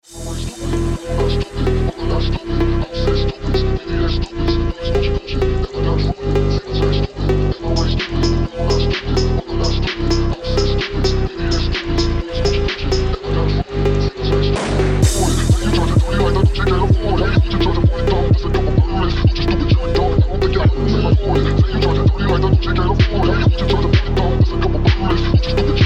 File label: Phonk; Ringtone